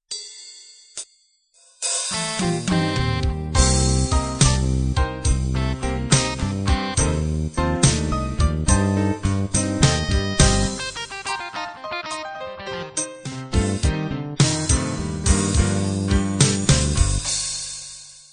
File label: slow blues intro